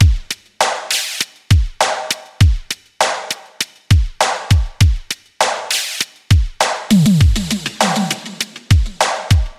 100 Beat Octagon; Zero-G; Future Wave; Beats

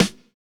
DANCE SET, DRUMS

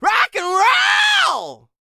ROCK, MALE HUMAN; ILIO; Vocal Planet

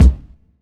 Lo-Fi One Shot Kick; WM+NM=MATRIX

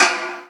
SN, Drum Machines; 300 Drum Machines; E-MU Orbit-3

